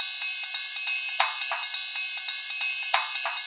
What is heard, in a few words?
Cymbal Ride, Cinematic Funk Urban; Sonic Foundry (Sony Creative Software); Cinematic Funk Urban Tone Poems